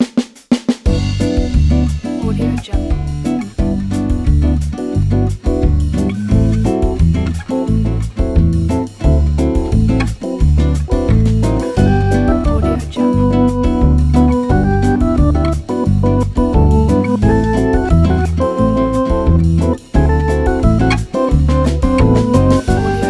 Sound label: elevator music